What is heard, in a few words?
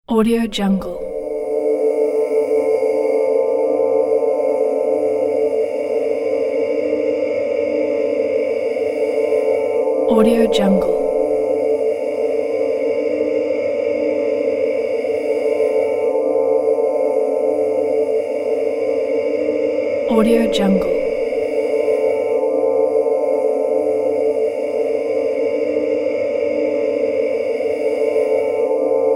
eerie spaceship hovering; sound-ideas